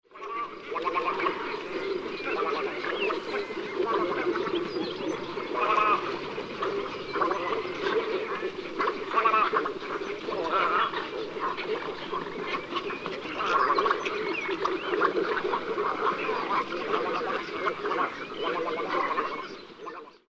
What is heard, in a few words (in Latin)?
Egretta garzetta